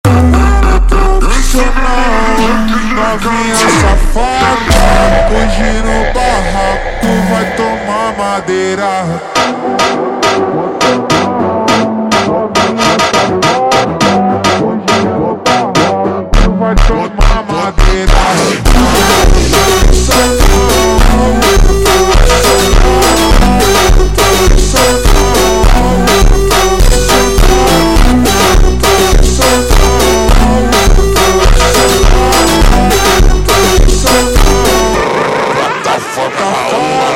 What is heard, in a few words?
Funk Super Slowed